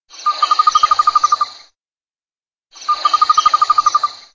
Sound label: frog in jungle